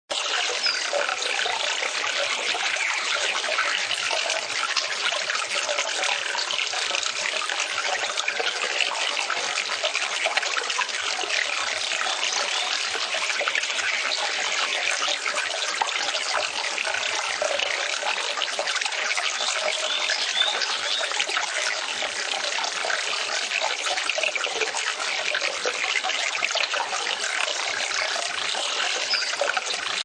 Swamp Creek, Constant running of a small swampy creek; Rivers; Nature